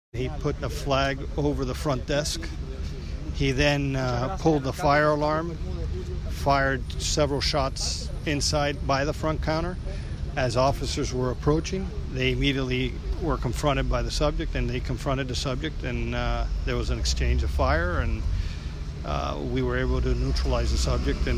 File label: organ